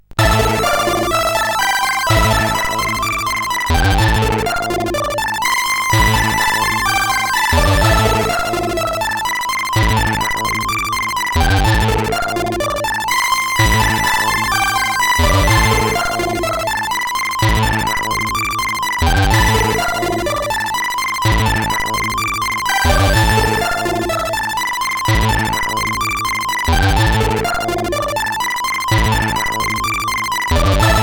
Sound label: X-Music Remix, CSG8580